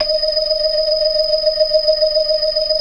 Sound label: Blood, organ; Keith Emerson Signature Series